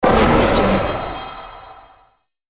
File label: missile launch